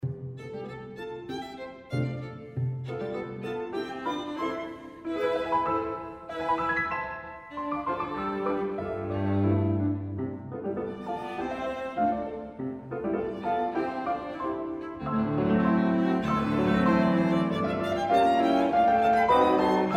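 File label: piano trio; o'neill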